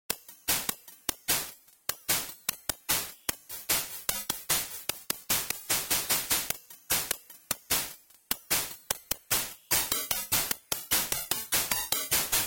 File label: Continue; Arrow Flash; Genesis